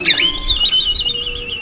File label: VIOLIN BIRDS; Best Service; World Colours